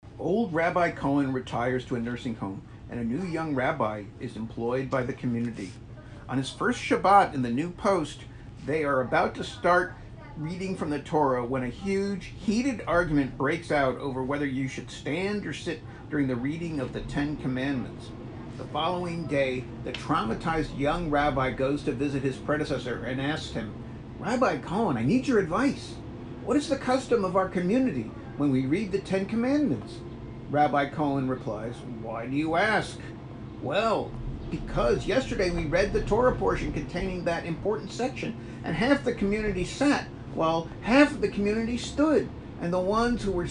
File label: Laugh Track; Joke